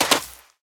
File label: deep sand land; Original TK Sounds